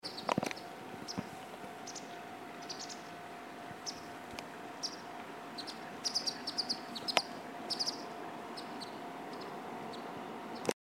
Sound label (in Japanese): hakusekirei; tori